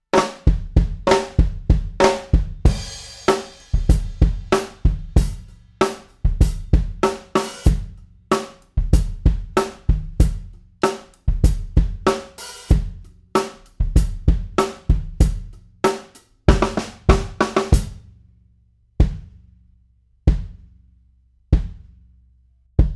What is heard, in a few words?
Kick fig 8, drum; drum shootout